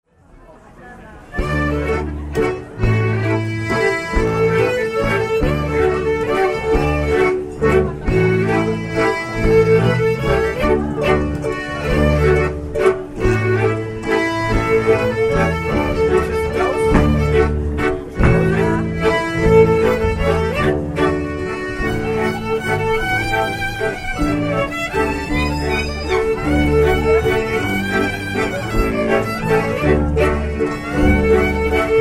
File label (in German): Schwedische Mazurka; Probeaufnahmen; Regen 2010; Giersberg